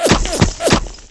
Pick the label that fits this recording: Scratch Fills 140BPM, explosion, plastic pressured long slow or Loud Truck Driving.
explosion